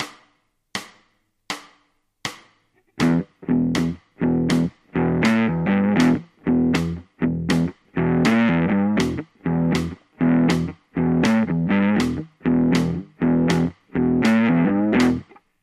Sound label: blues, ritmos de blues